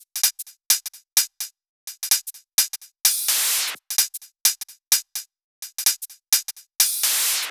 128BPM Kit; Vengeance Samples; VFH1 128BPM Wanted Kit

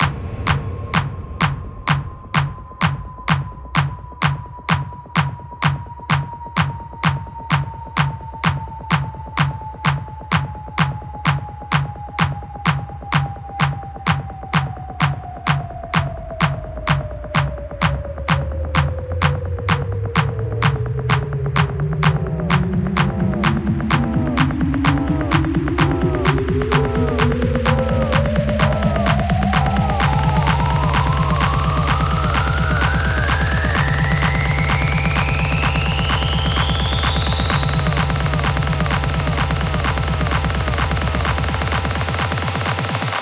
128 BPM Spooky; Vengeance Samples; House Electro Fills

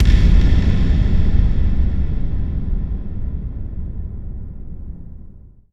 Hits & Explode

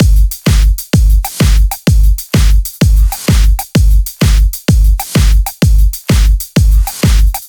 128BPM Kit; Vengeance Samples; VFH1 128BPM Tron Trio Kit

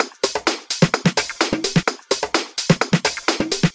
House Loop, 128 BPM; Vengeance Samples

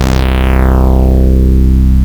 Ultimate Bass Root C; Vengeance Samples

